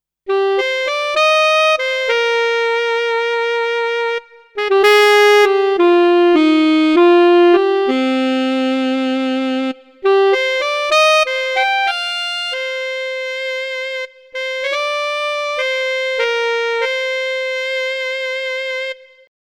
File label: Yamaha Sonogenic SHS 500 Alt Sax